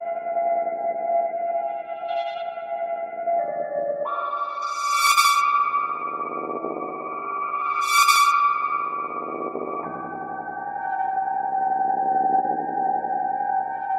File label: Strymon Magneto Tape Control w Step Sequencer Key CV